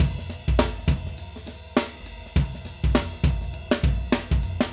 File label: Acoustic Live Drum; Platinum Audiolab